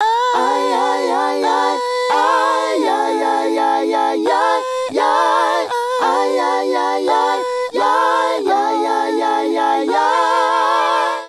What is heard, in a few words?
Male Group, Hip-Hop and RnB Vocals